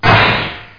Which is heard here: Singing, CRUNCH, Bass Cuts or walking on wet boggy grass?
CRUNCH